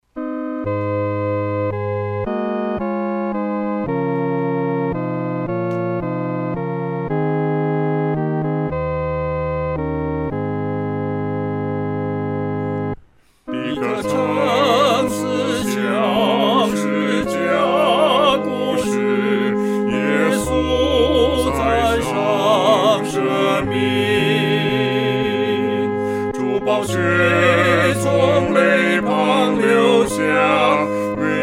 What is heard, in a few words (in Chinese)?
合唱 二声部